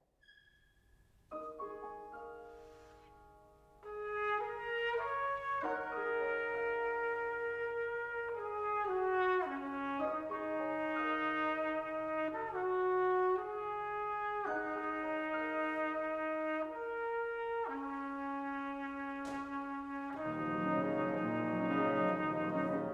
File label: Sonata for Trumpet and Piano; I. Lento-Allegro Molto